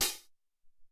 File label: M Amb Hat, Alesis SR-18